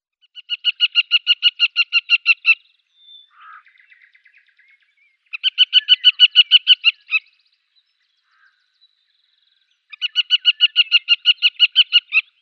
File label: Western Marsh-Harrier female